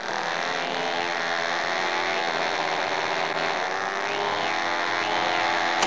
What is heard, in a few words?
skate; McDonaldland - Silly Games; McDonald's; snakes